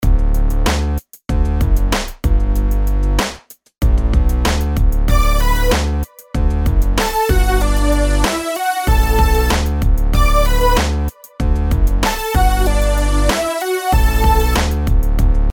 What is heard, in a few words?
rhythms simple 8s major